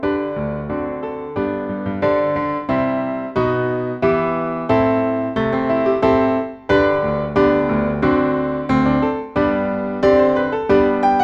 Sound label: accomp; ye mariners